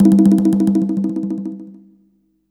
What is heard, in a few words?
Percussion; Vengeance Samples; VEH2